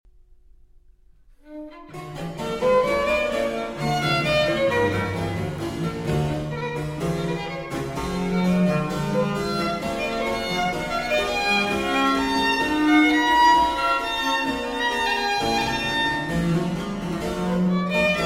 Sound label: Violin Sonatas, Sonata in E Minor; 12 Violin Sonatas; No. 4 II. Viste